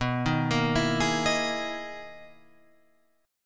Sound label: B Major 13th down Guitar Open F; arpeggiated; JGuitar